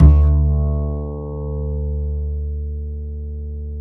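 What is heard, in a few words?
double bass D; Univers Sons; Jazzistic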